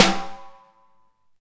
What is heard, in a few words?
Snare, Alesis DM5 Drum Machines